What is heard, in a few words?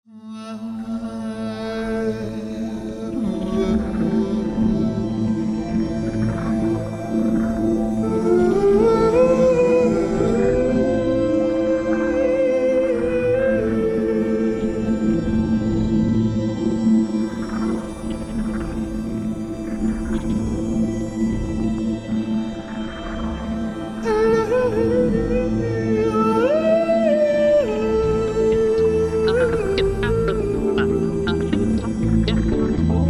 jingle